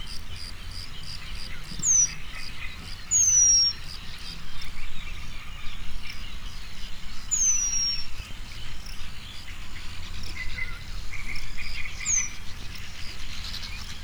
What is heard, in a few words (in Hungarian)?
függőcinege bölömbika; Hortobágyi Nemzeti Park 2013